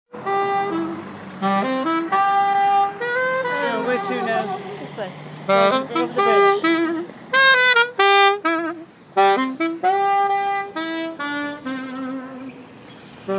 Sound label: sax